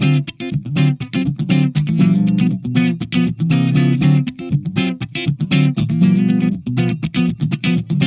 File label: FAST CHUG, Funk Guitar; Zero G - Funk Guitar